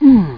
UHH

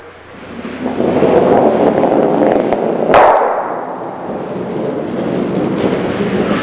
Curling Rocks Hitting

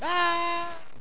A fall; landslor

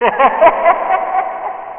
Hollow Laugh; Curse of Dragor

